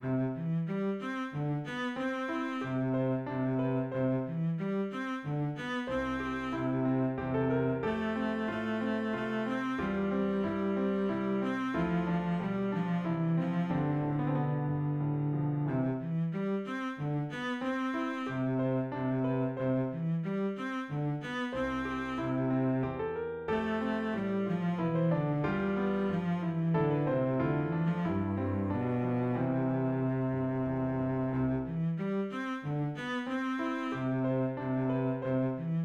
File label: Piano